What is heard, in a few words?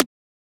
Transient; FLPRO